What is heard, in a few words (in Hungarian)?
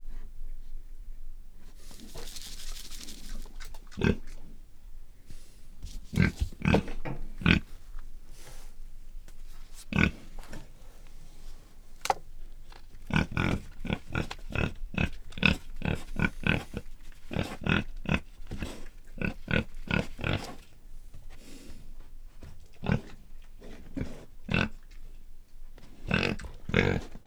röffentések, házisertés; octava50hz; Egerturistaház